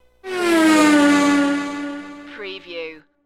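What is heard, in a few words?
Speeding Car Pass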